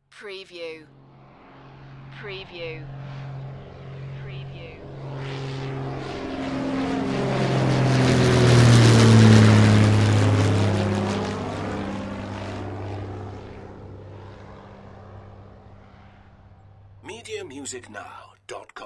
airplane prop plane; sound effects bible

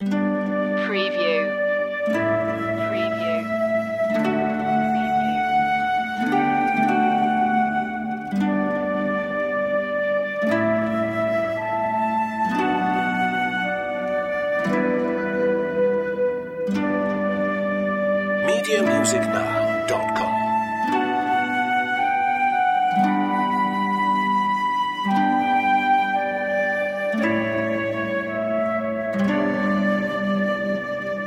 flute harp mix

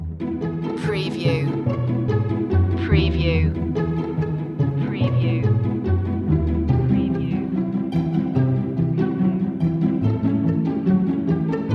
quirky plucky strings

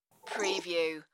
Sip

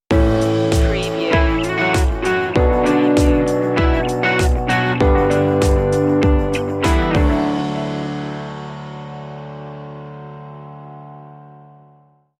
dreamful stinger

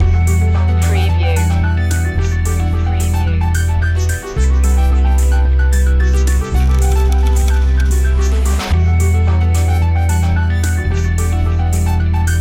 positive guitar background loop

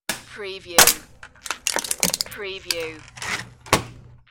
Can Crusher

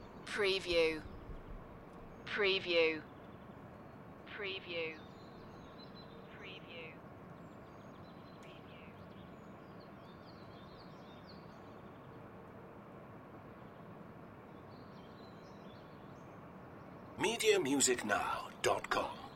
Birds Summernight

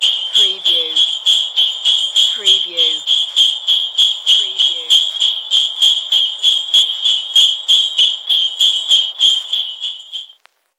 Antique Christmas Sleigh Bells

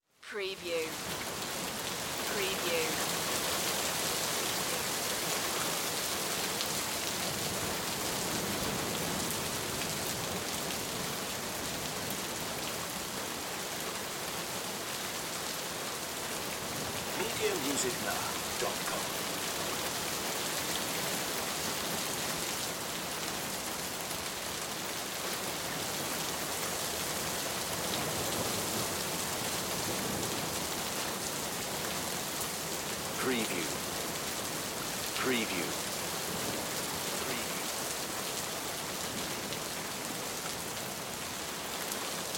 Rain Garage

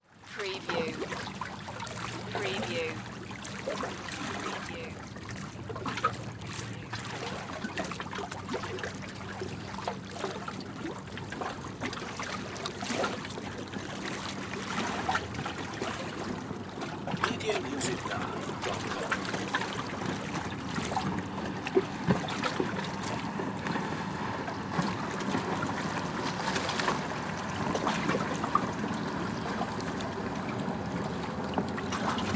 Lake Dock Waves Splash; Sound Effects Bible

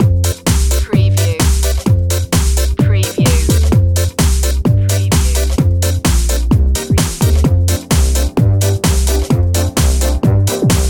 electro swing

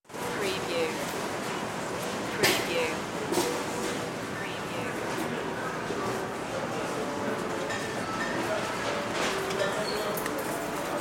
Grcry Str

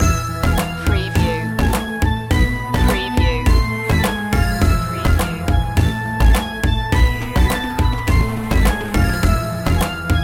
retro video game snake invasion

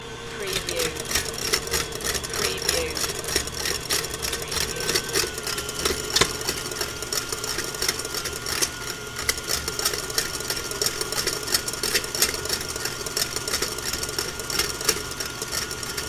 Blender

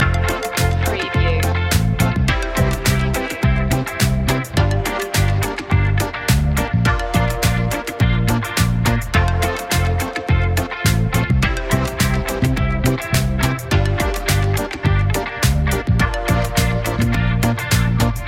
carefree day loop

